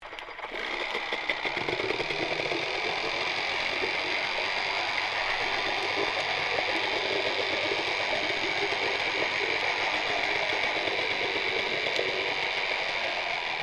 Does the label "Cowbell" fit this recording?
No